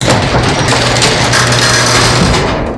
seracinesca

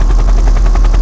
Elicottero

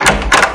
maniglia, porte

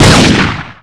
fucile

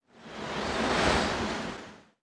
onde del mare, natura

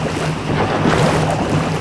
Veicolo su acqua, motori

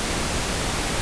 Vapore

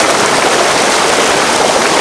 Ruscello, natura

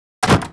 porta sbatte

